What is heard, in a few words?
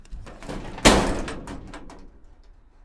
shed door open